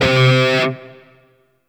Shawzin Oct Two C; Warframe; Grineer Shawzin

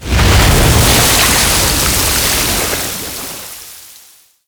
Sealab Wave Crash Large; Warframe; The New War

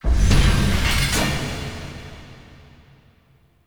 Siege End Success Stinger; Hud UI

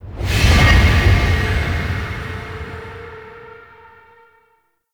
Ghost Ring Activate; Gameplay; Warframe; Chimera Prologue

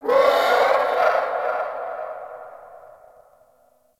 Night Distant Creature Large; Warframe; Eidolon Plains; Ambience

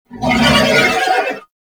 Horse Vocal Screech Stopping; Vehicles; Warframe; Duviri

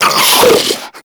Infested Diseased Ancient Breath

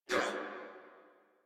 pain crewmen; Warframe; Corpus Crewmen; Crewman B

